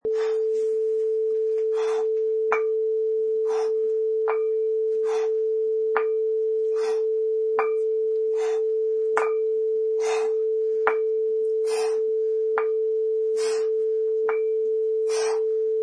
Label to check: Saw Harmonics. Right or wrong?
Wrong